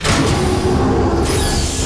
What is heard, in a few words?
door